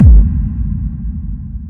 One Shot

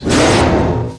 Flashback

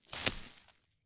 Plant Harvest

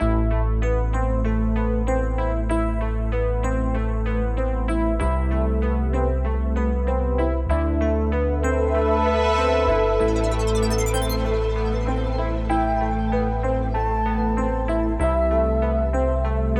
Synthwave Retro Electro

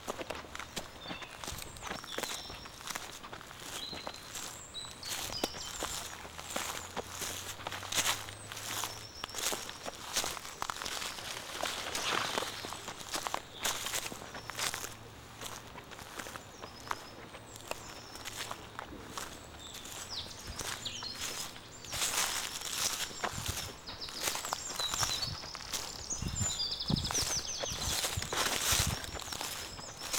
Saltwells Nature Reserve Walking In Woods

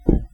Thunk

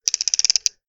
Turning Dial

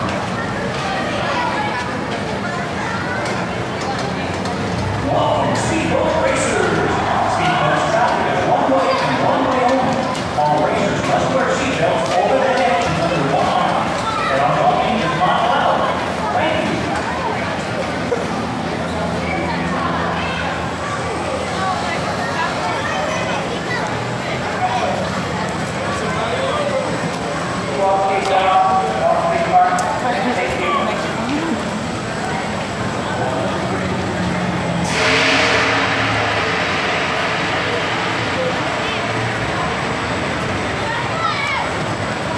Bumper cars

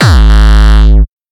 Hardcore kick long

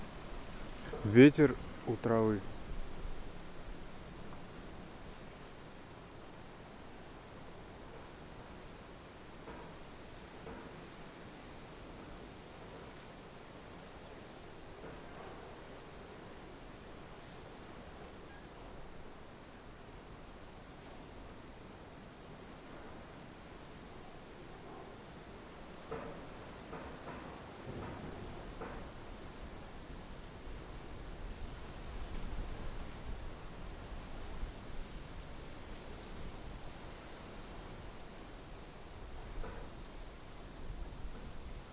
Wind In The Grass Small Town

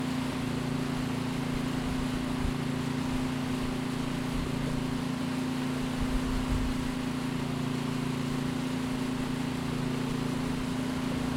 Generator

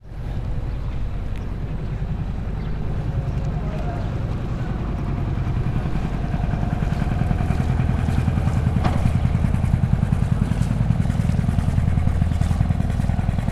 Small Fishing Boat

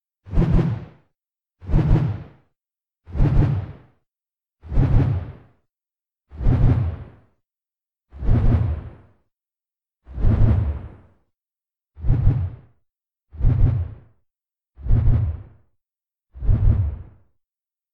Swooshmania Jumpers Variant

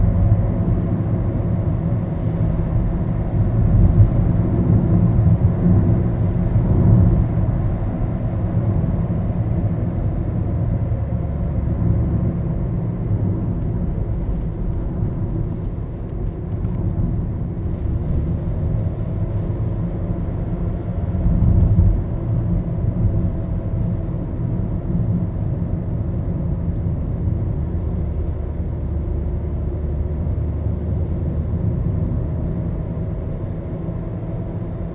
Car Interior

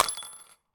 Broken Glass

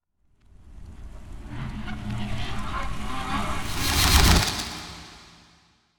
Risers Horror Whooshes Tension Builder

